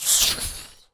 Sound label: Rocket Launcher Shot; liblast; Weapons